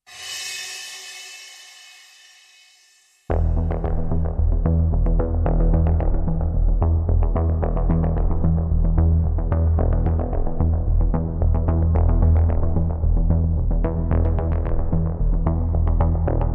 Immense Space Drone

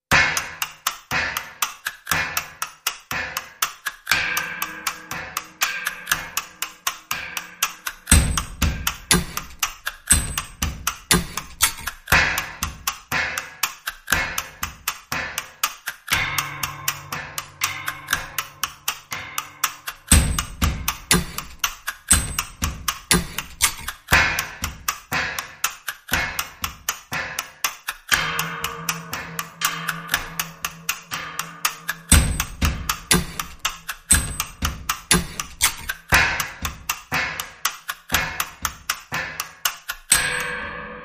Hits Only

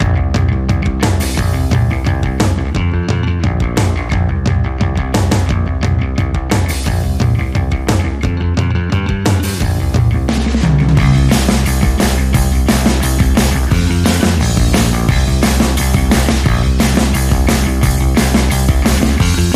Drums and Bass Mix

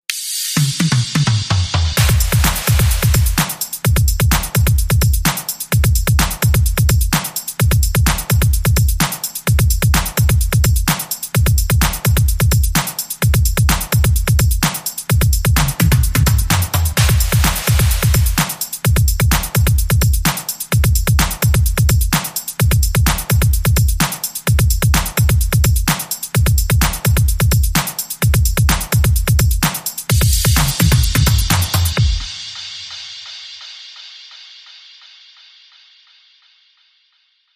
Hipper Beat

